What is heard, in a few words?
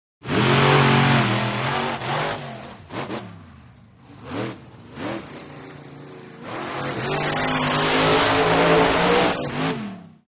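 Monster Trucks Revving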